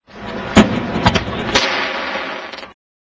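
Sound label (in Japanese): hanabi, fireworks; 着信音やアラーム（目覚まし）音に使える、着モーションとして; FOMA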